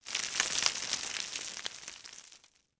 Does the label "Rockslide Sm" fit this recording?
Yes